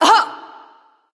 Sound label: surprise; freya